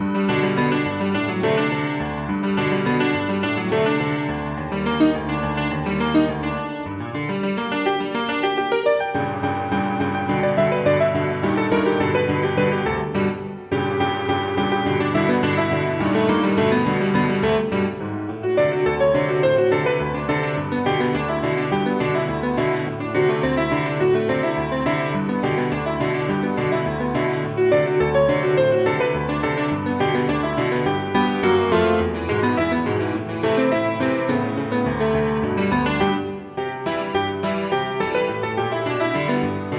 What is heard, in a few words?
MX PIANO; Lego DC Super Villains 2018 Nintendo Switch